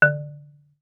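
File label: Marimba deadstroke fortissimo D3; MIS Pitches - 2014; Percussion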